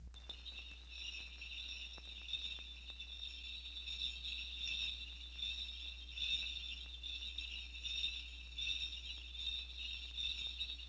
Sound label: Sleigh Bells Continuo; Electa Quinney